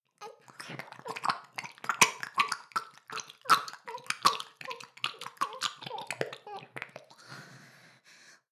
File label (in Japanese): 耳舐め音声(LR逆ver); ASMR; ダメなメイド